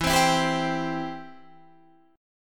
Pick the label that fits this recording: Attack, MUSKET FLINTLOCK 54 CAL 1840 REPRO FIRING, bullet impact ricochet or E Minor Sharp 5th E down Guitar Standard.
E Minor Sharp 5th E down Guitar Standard